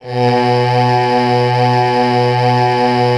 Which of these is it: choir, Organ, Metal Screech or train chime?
choir